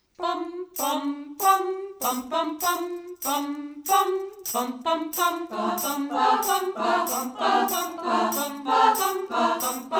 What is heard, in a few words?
Jingle Bells